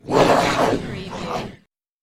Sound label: dog monster growl